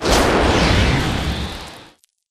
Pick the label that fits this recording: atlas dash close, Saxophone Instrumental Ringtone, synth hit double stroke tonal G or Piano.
atlas dash close